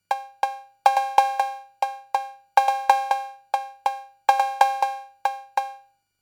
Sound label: Cowbell